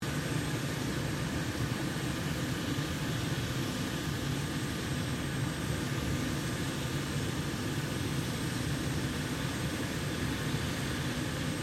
HELICOPTER 1990 SIKORSKY UH60 BLACKHAWK ENGINE IDLE LOOP; Helicopters; Sound Effects:Vehicles